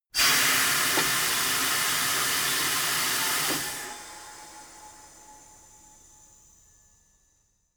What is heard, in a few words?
tire changing machine hydraulic out